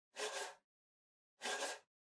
PC Mouse Move Far Wood Short

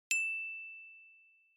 Glocken Single

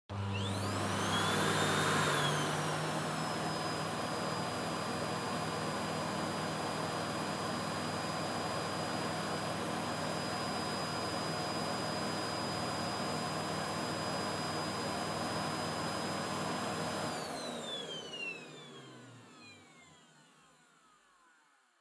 Vacuum Cleaner Vacuum Floor Soft Low Still; 掃除機; バキューム 床 ソフト 低 静止

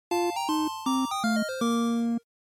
NES Sports Clear

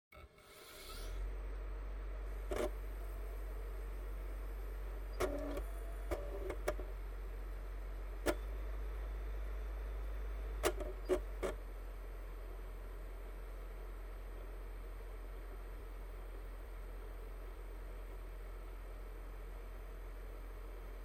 Optical Disk Drive Operating Noise

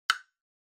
Woodblock, High

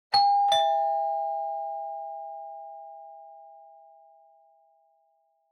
Doorbell Mech Tone, Close; ドアベル 機械式 トーン04-03(近い)

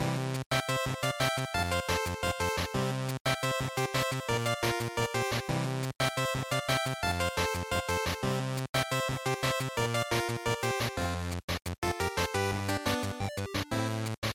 GB Racing Title Loop175

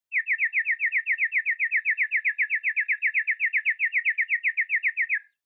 Onoma Dizzy Fast Mid

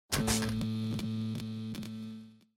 Horror Accent, Long

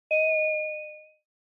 Single Accent High

